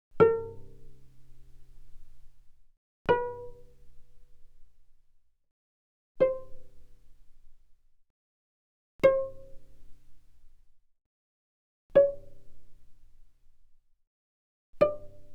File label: Cello pizz ff sul A A4 Bb5; mono; Strings